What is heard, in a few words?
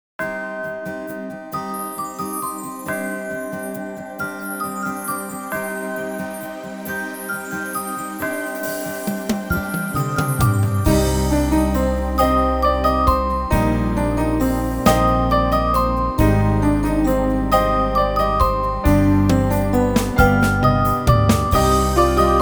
Ukrainian Bell Carol